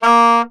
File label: Soprano Sax Portato A#2; Orchestral; DirectWave; Varazdin Orchestral